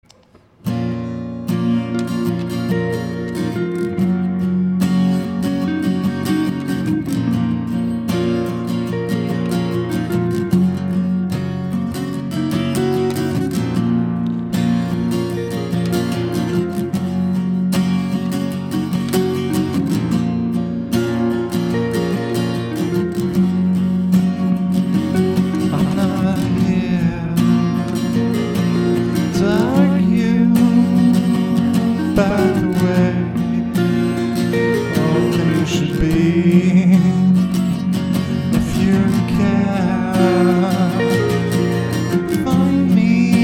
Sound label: acoustic